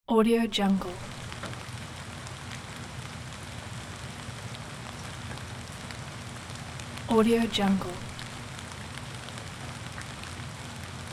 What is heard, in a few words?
rain dripping